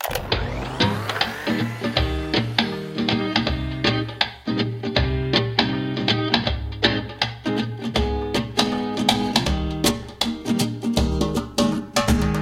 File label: Quest Stinger Busking Boombox Play Intro; club penguin island; android